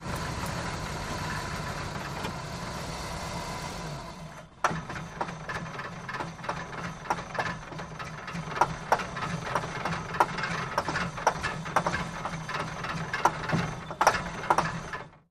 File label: Car Engine Die Diesel